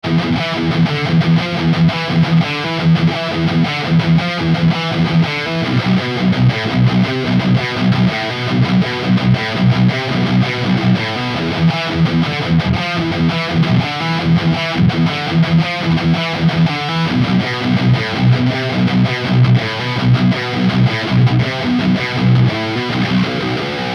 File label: LTD Baritone Arctic Metal Chunky Rhythm